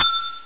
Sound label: bell